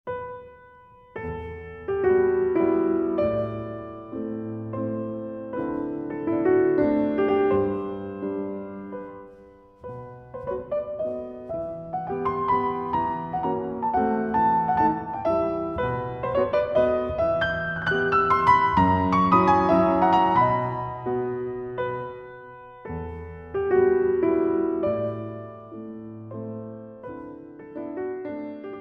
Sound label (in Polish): Mazurki e moll; F Chopin; 4 Mazurki Op 17 nr 2